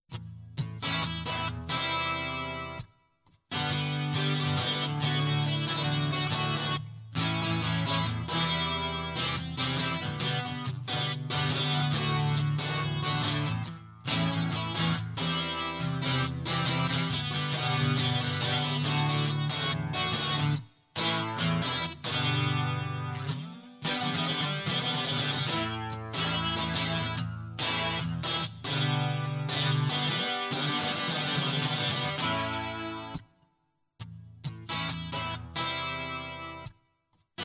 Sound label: Guitar Loop